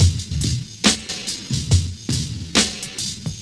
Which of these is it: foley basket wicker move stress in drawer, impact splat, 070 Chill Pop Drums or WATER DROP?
070 Chill Pop Drums